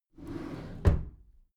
packed drawer smooth close soft halfway

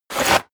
blade draw dull

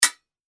fencing sabre

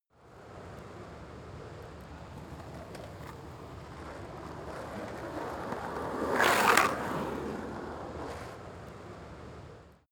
skateboard single drive turn

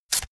velcro large open fast

